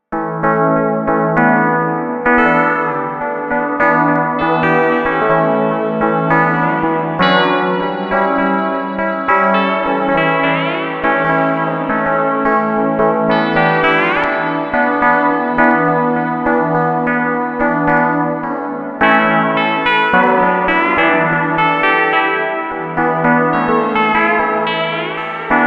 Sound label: vhs